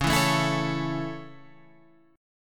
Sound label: C sharp Minor Sharp 5th